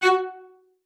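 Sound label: CELLO spiccato F#5; Xsample VOL-5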